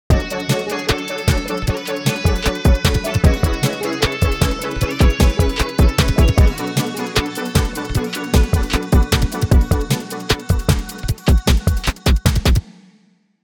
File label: my fav short beat